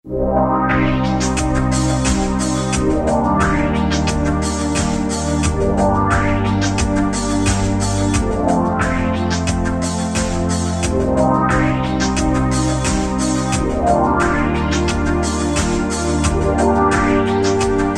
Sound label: Sweep Beat; Roland M-DC1